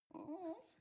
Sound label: dog whimper